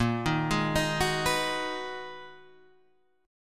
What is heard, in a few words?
Ab Minor 7th Bb chord; Abm7/Bb; arpeggiated; JGuitar